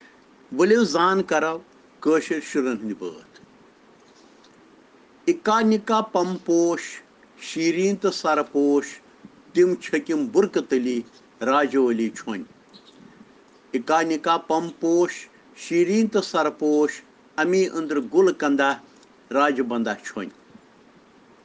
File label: Children Rhyms in Kashmiri